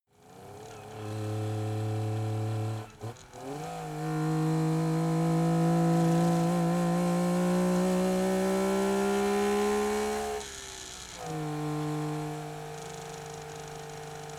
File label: car saab 92 drive low stop engine